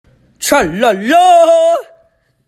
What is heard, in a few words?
tralalaa